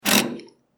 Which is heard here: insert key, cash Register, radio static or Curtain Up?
insert key